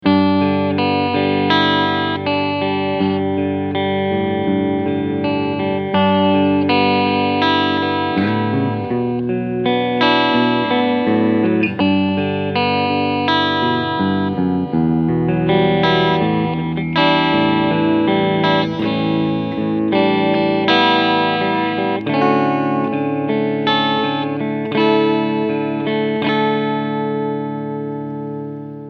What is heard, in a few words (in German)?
Cleansound beide PUs